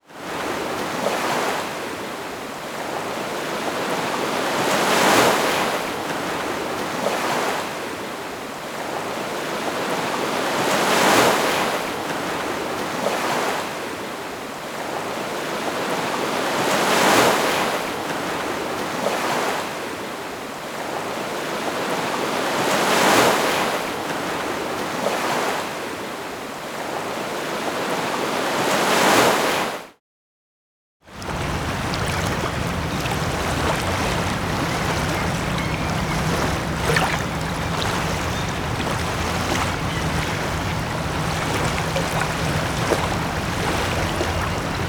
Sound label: Smooth Waves